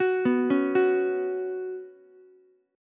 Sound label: Gb Suspended 4th Gb, down, Ukulele; arpeggiated; JGuitar